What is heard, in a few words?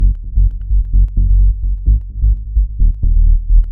heavy bass sub 129 f sharp; loops